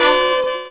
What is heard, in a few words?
HORNHIT; E-Lab; X Static Goldmine 2; akai